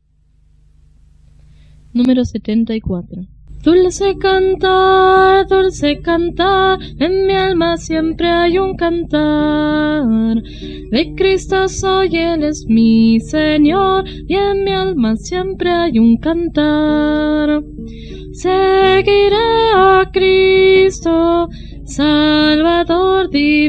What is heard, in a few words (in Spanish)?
Coro, himnario niños